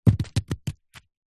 foley rock small roll land compacted damp mud ground; zapsplat